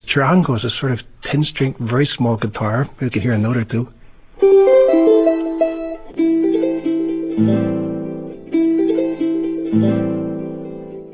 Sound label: charango alone